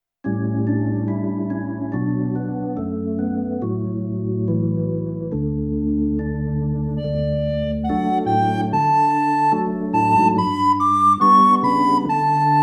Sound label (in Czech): flétna + varhany; Starý Joe; Flautoškola 2 – METODICKÉ NAHRÁVKY a DOPROVODY